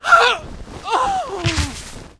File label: bloody lady dead, monster